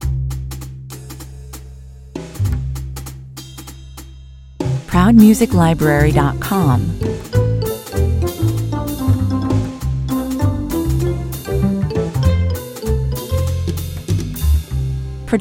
easy street xl (without beats without brass) 196bpm